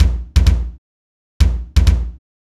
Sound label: polarity kick